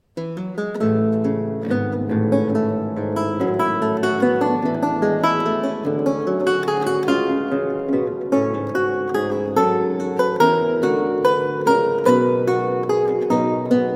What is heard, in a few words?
Partita B-Dur Courante; Jan Antonin Losy